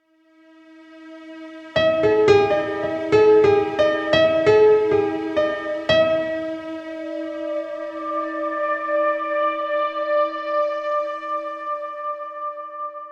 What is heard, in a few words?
Music One Shot